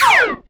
Pull Normal; BopIt DemoSFX Pull Normal

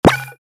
shot ice

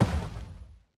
Bear Step

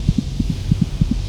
Close Loop; Mines Core Hero

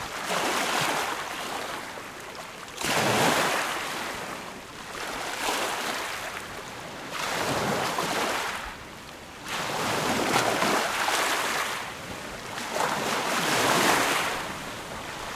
Beach Ocean Waves Water Loop

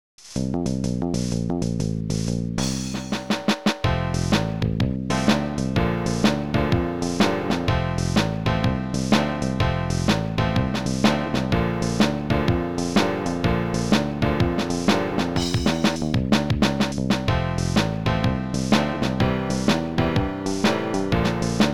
Blues; The Big Red Adventure; Amiga

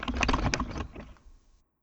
Tank Motor

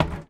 Footstep Plastic Tech

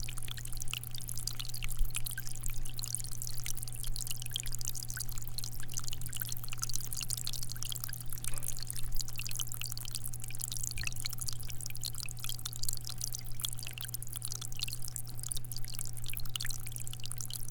Water From One Hose Running Over Rocks

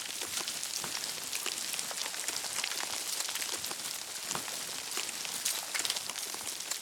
Surface Dirt

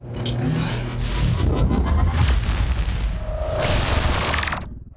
Bullet Shower

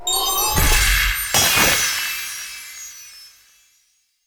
Ice Thorn Stalact

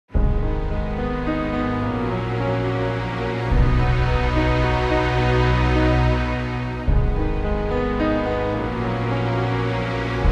Steadfast Emotion 107 3-4

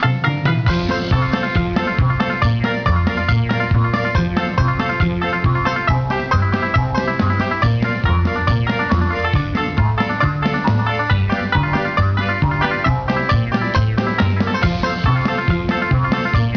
Flip Track Mountain (Instrumental)

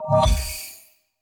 dark Strike

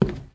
Wood footstep

